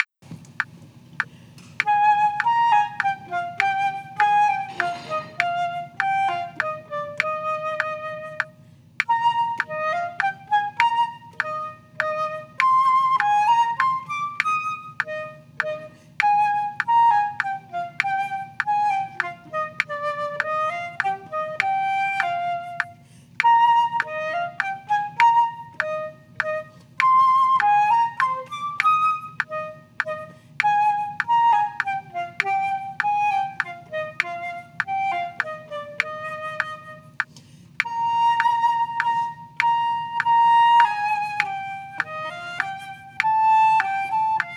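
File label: trio with percussion, minuet; bach petzold; toe excellence in chamber music bk 2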